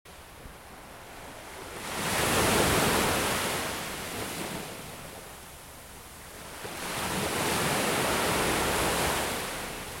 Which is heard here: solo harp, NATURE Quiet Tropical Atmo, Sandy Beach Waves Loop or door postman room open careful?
Sandy Beach Waves Loop